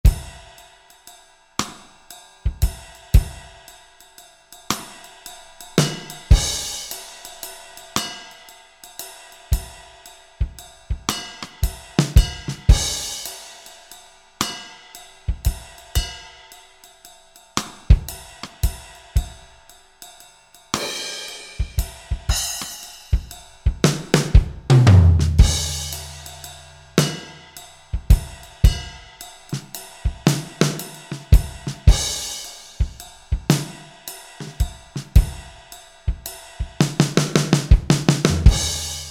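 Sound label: Room Ballad KIT, Roland SRX-01 Dynamic Drum Kits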